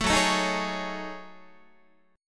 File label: Gb Minor 6th Add 9th Gb down Guitar; strummed; JGuitar